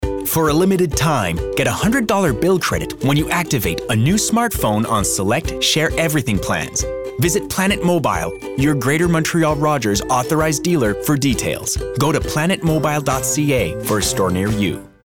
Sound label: music; en